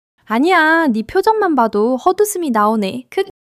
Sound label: laughter